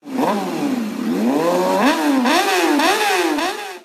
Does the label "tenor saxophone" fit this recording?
No